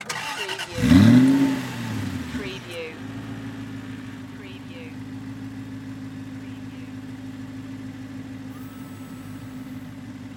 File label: Auto Aston Martin; sound effects bible